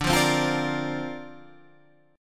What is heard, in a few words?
D-Minor 7th Sharp 5th D Guitar Open G, chord